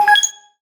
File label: tonal oneshot Ab; Pop; Slate; PASADENA Pop Sample Pack; Tonal One Shots